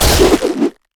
bola strike; rengar

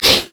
lesser wraith effort; LoL; monsters

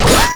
grenade; rumble skin03

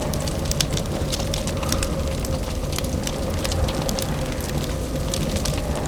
fire; LoL; blue purple fire

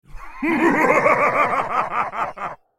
Demonsword laugh; Tryndamere

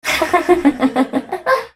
laugh; Oriana

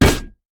pirate basic attack; LoL; gangplank

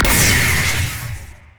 shock blast; LoL; jayce

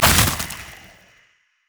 crystalize; LoL; anivia

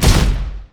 dragons descent; LoL; shyvana

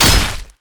anchor chain enemy; nautilus

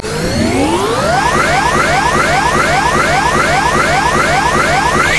dash; Vi

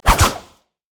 swipe; nidalee base

